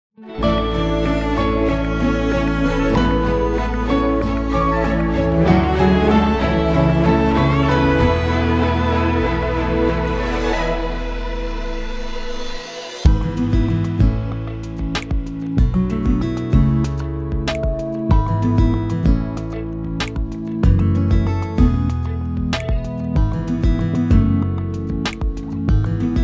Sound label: Instrumental